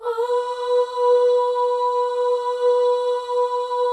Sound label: OH, FEM, Symphony of Voices; Spectrasonics